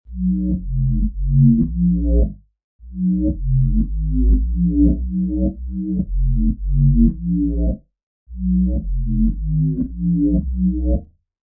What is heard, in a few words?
Chorus - Bass - Roomy Chorus; CRTIV Chorus; Reason Bass